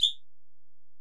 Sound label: Ac Perc, Drum Machines Alesis DM-PRO